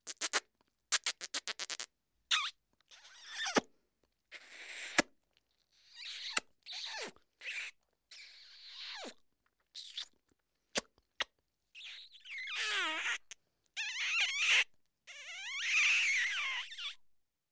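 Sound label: comic comedy kisses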